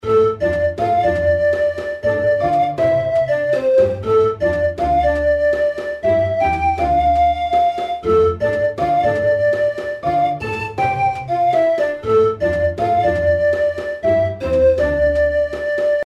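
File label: outro background music, lost realm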